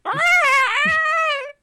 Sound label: deer sounds